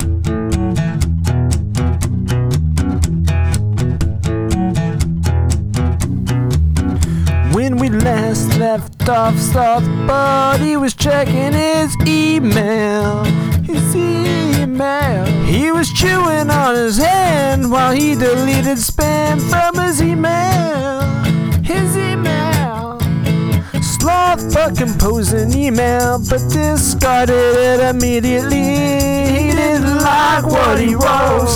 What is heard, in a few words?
acoustic